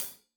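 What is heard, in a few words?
hat closed, hi-hat cymbals stereo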